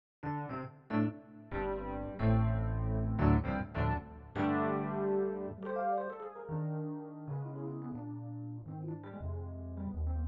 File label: rhodes; Kurz